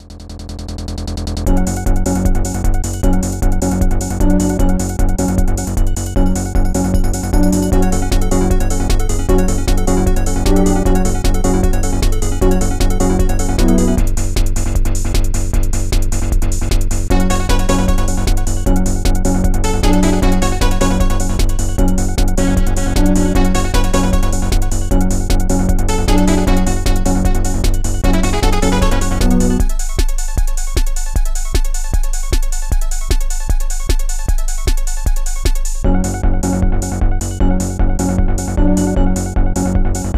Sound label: Game Won; Ken Griffey Jr. Winning Run; SNES